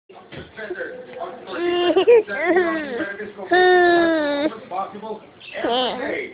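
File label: baby hungry, male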